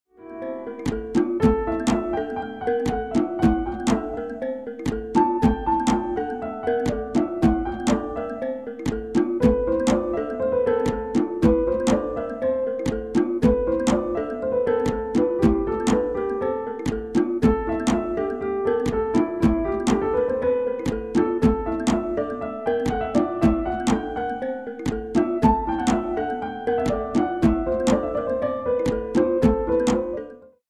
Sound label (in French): percussions lentes